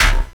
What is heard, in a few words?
CYBER KIT, DRUMS